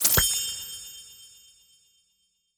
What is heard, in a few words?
Team Crown; Yu-Gi-Oh! Master Duel